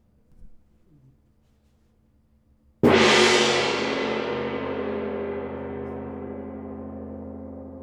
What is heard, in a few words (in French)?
regime transitoire, GONG VIBRATION